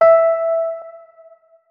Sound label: notes, keyboard, electric piano